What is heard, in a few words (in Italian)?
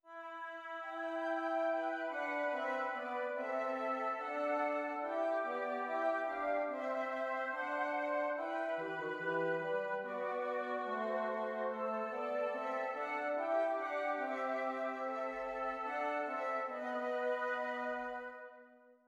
esercizi di doppio contrappunto nella decima